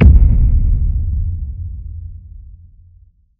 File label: spin; cash noire